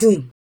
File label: VOX, VOCALS; Ueberschall; Jam Box